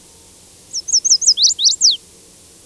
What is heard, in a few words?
Chestnut-sided Warbler; ibm glen